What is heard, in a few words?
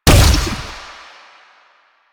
Fire Shot, Weapons; Duviri; Incarnon Adapters; Incarnon Dera Vandal